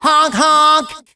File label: Honk honk; Nicktoons Nitro; arcade